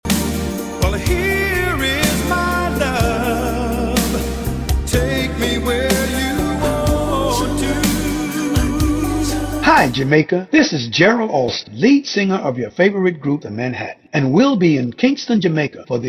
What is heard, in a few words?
JINGLE